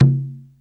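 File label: LOW CONGA OPEN; Greytsounds VOL-1; LATIN PERC 2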